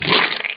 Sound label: squirt